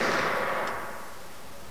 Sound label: mp40 ringout; WAR